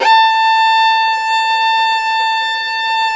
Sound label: VIOLIN A3, Balkanish Traditions